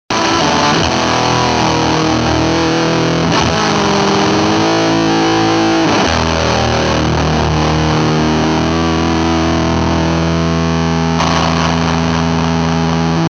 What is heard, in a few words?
chaotic fuzz